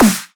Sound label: normal hit whistle